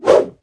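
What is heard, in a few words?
Attack One Sword; Seer; GamigoNA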